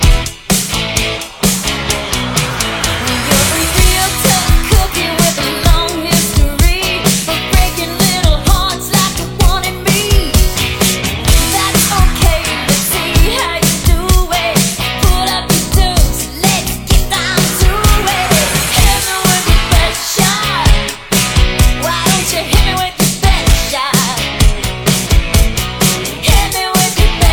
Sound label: REDRUM Slam End 128bpm E